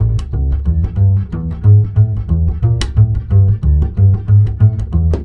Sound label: BASS, Balkanish Traditions